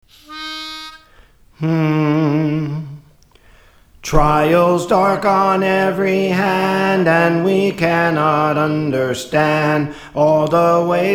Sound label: Soprano; Trials Dark on Every Hand; Praise For The Lord Training